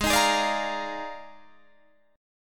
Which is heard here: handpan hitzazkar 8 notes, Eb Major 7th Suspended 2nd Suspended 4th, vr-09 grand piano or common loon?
Eb Major 7th Suspended 2nd Suspended 4th